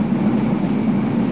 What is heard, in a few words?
background